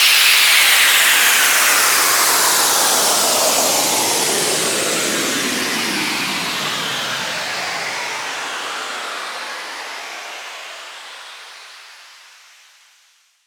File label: SFX Down, Dubstep